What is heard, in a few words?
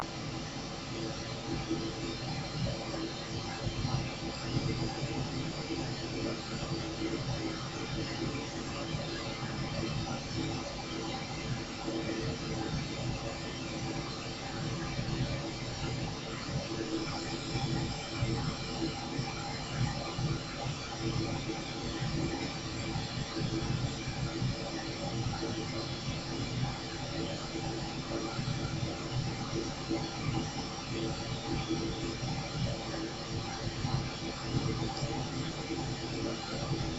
Pink Noise